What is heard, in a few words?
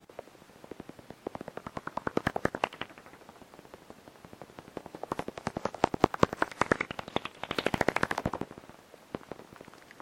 Batscanner